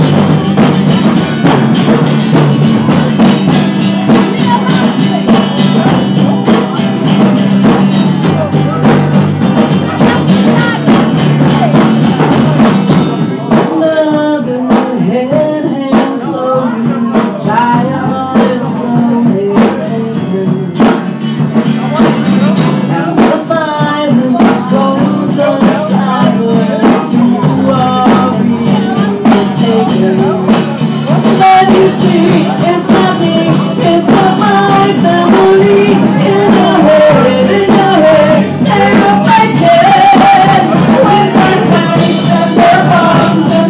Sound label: open mic night; at the cross keys